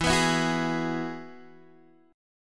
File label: E Major Add 9th E, Guitar, DADGAD